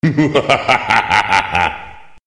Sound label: muahaha; hl2dm; oppressive territory